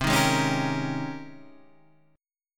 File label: G sharp Major 11th C